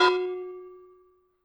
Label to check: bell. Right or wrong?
Right